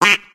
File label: bumper car quack; Source Engine; tf